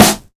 good snare one shot g sharp key; snare drum one shots

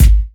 clean kick drum single shot g sharp key

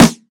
sharp acoustic snare sample a sharp key

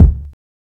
short reverb steel kick drum c sharp key

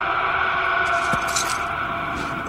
yelling echoes

